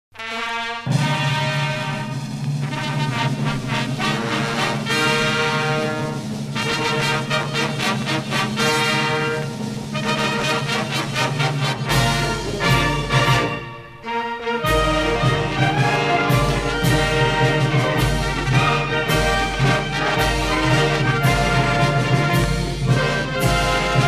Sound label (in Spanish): Himno del Estado de México (sin vocales)